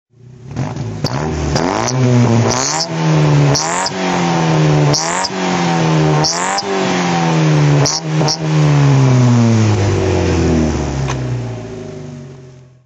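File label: morgan 44 throttle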